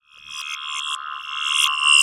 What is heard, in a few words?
Morph Swell; bottlerocket